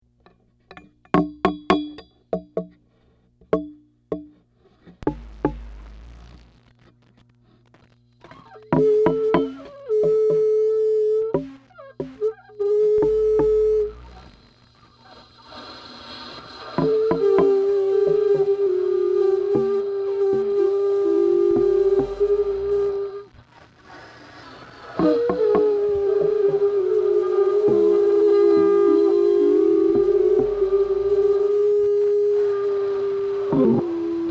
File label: horn; ciat-lonbarde; cocolase